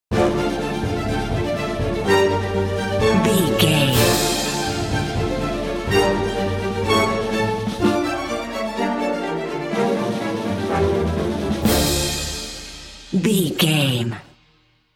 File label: time running out bumper